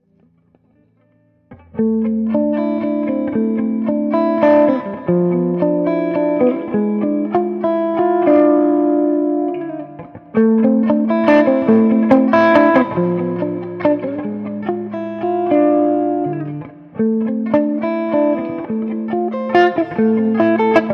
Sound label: einstein clean channel; diezel einstein